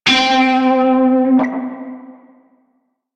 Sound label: Shawzin Flourish Oct Three C; Music; Warframe; Narmer Shawzin